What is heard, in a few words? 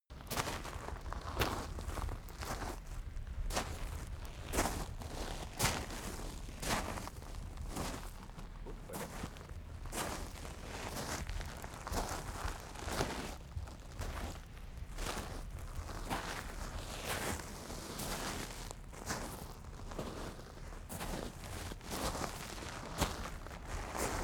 step gravel walk